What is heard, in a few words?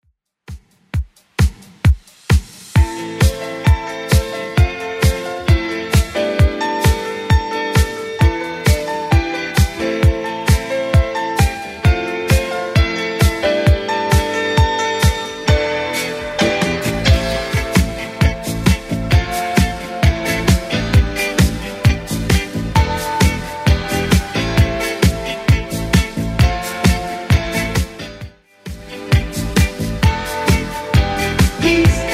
80s Redrum 132bpm